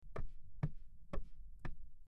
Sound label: footsteps on wood floor